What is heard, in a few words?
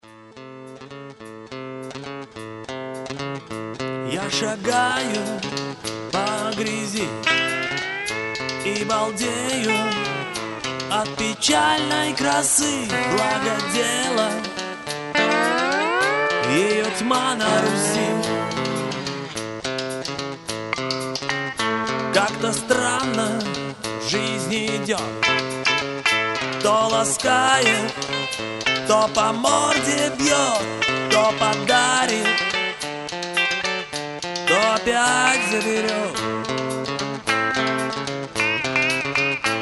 rock n roll